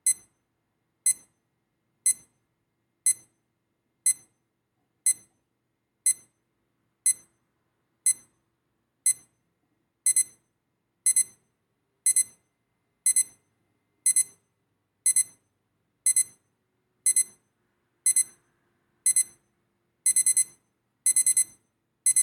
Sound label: sample of the amplified sound of the alarm